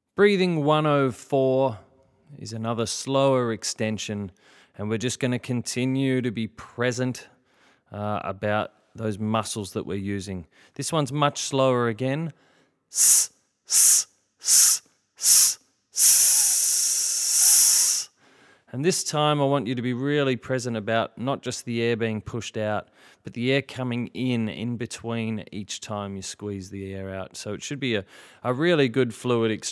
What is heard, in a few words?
breathing, singing